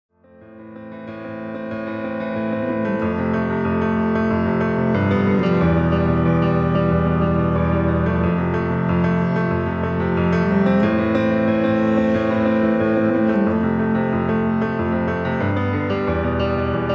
Guitar

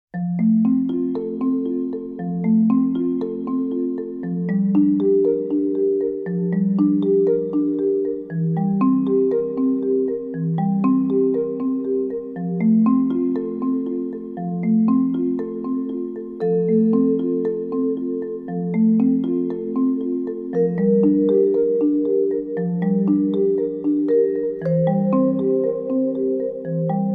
Double Drums, Grooving Christmas, Ave Maria

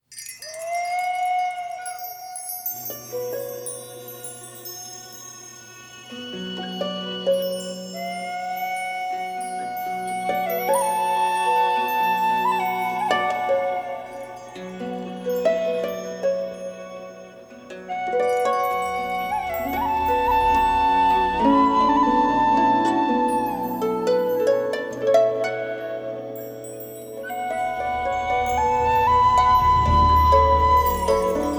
Irish Melodies

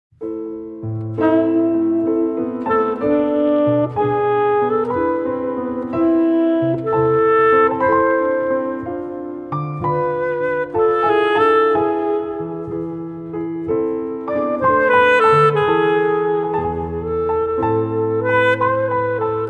Improvisation; The Wide Point

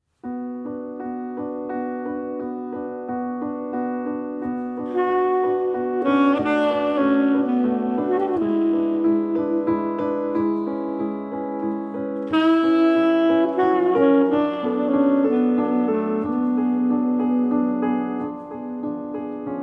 Improvisation

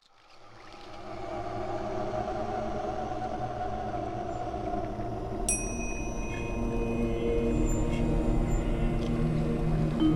Double Drums; God rest ya merry gentleman

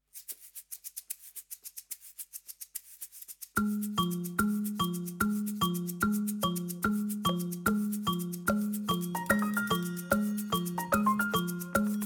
Afro Blues

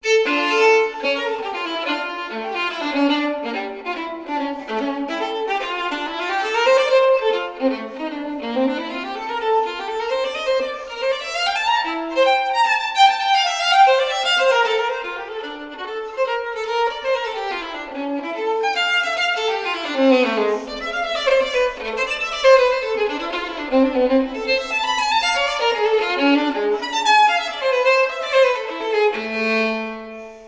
Partita No. 2 in D minor BWV 1004 Corrente

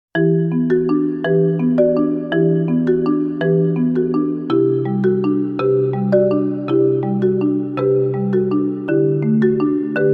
Double Drums, Grooving Christmas; Silence between years

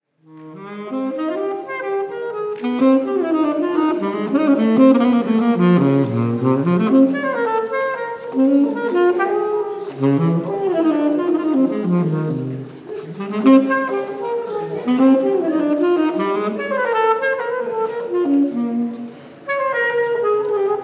Solo; Solo für Julie

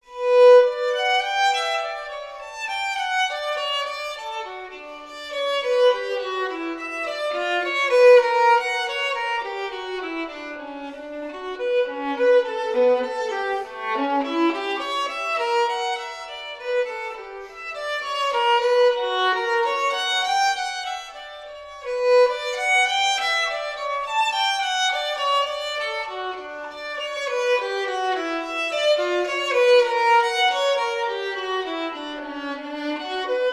Partita No. 1 in B minor Double; BWV 1002

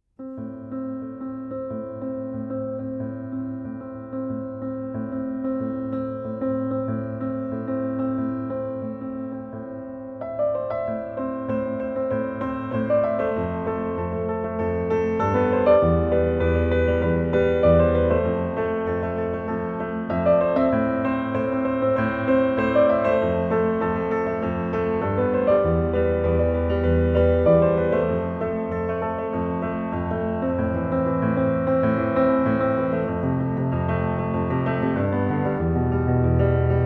Guitar

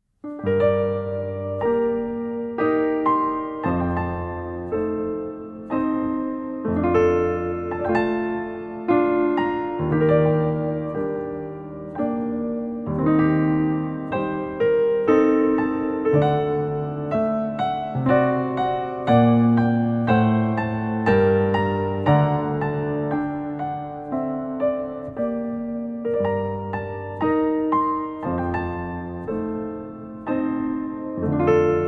Menuett in G minor

